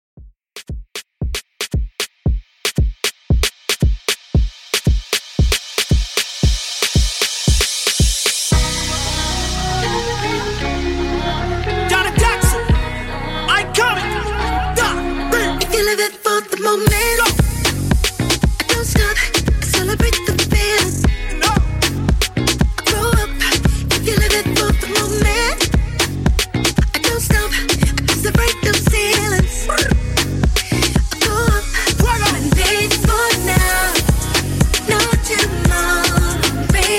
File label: Afrobeat 115Bpm